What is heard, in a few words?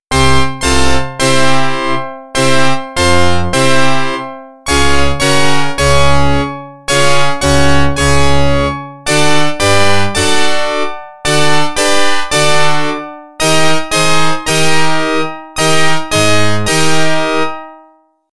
Organ; NHL 94